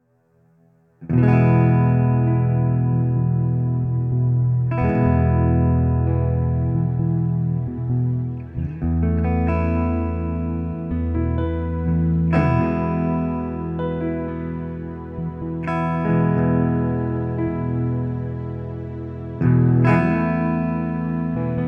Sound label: delicate key of E